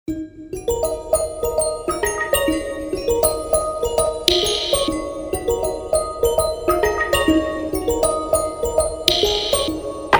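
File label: ringing of bells beautiful melody; zvon-kolokolchikov-krasivaya-melodiya-iz-nachala-ringtona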